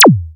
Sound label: SQEEK; EMU Orbit-3